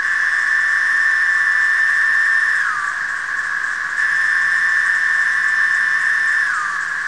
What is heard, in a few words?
Periodical Cicadas; Michigan Cicadas; ndecim